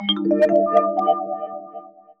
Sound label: powerup